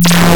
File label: SINE